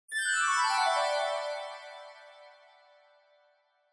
Scene Change High Down

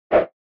SNES Fighting Swing

